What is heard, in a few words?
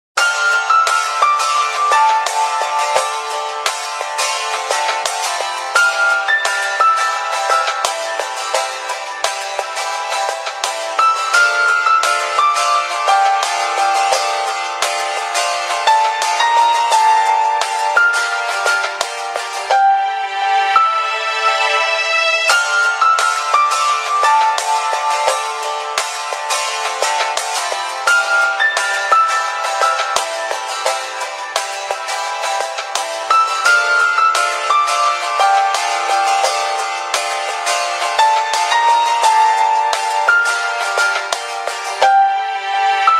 zte